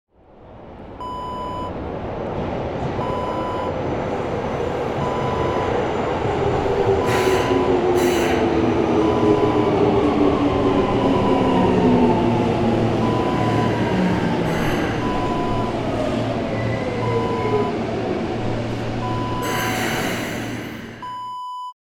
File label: metro pulls in